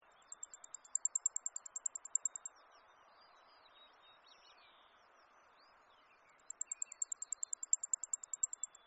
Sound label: Blackpoll Warbler